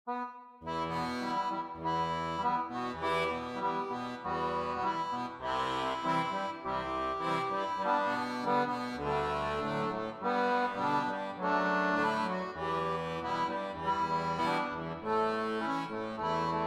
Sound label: Accordion; Return to Monkey Island